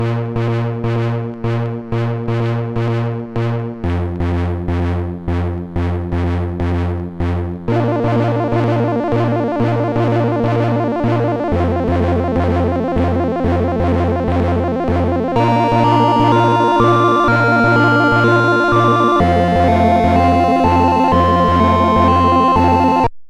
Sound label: beat; MusicLine